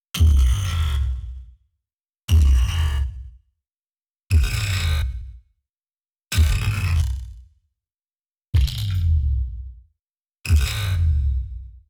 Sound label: Impact Scratches High